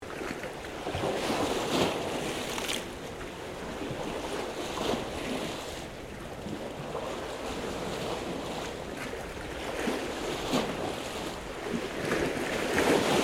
Water Waves Under Pier Medium Loop, Waves at StKilda beach; Ocean; Nature